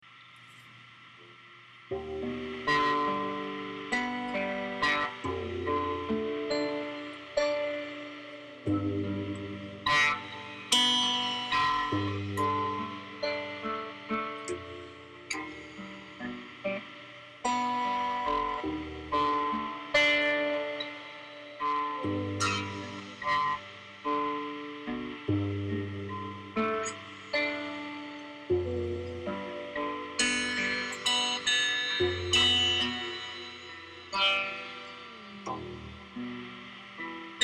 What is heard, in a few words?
mariachi bass experiment; weeklybeats 2012